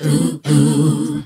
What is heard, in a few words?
"ooh ooh" group SUNG; ooh ahh